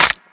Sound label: Claps